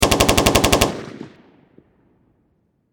Machine Gun M16 Long Burst Close Perspective

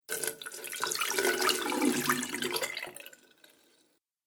Water Pour Down Sink; Pouring Sound Effects

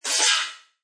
Button Metallic Hit Reverb; Scifi Production Elements, Set 2

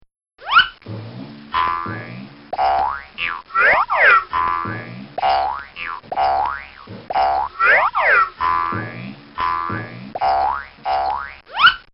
Cartoon Sound Effects Bongs

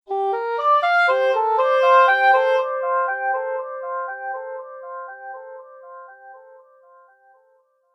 Subtle Musical Echo

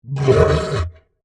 Monster Grunt, Horror, Monster, Grunt, Creature

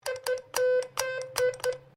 MORSE CODE QUESTION MARK; Morse code aplphabete; Morse Code (Sound Effects:Electronics)

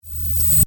Electric Discharge, Magnetic, Science Fiction; Attraction; BlastWave

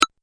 Button Digital Click

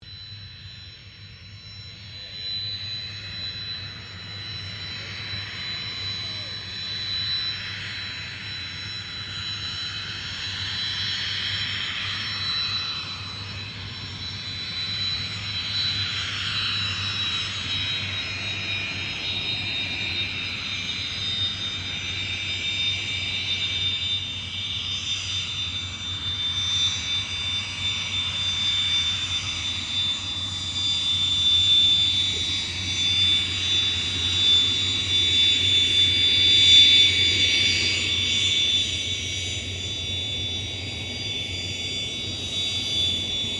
AIRCRAFT CA-27 SABRE TAXI; CA-27/F-86 Sabre; Airplane; Airplanes; Vehicles

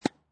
Tennis Hit Ball with Racket Medium; Lynne Music

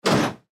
Herco Door Close Hard; Miscellaneous Doors; INDUSTRY

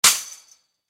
Impact Fence Metal Hit Strike; Metal (Sound Effects:Materials); Blastwave

